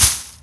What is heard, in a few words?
lay mine